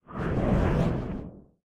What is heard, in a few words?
float; smite; thanatos